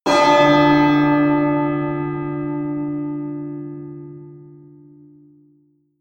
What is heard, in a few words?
melodic church bell